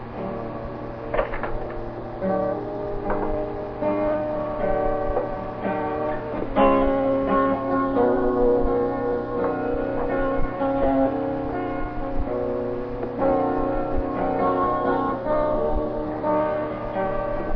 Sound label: Riff